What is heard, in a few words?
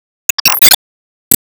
Enemy Revive